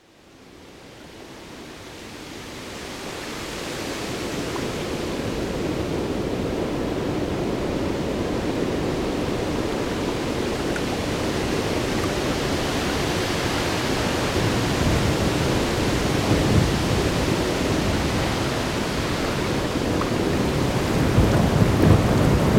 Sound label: Storm